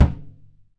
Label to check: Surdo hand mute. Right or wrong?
Right